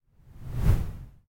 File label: wind down